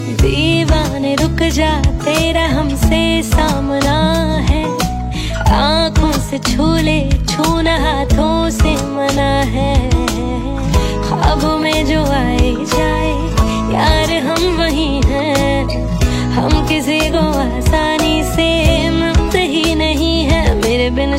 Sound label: New Romantic Version